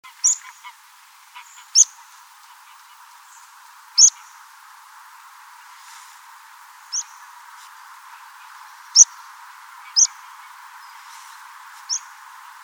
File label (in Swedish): Vattenpiplarka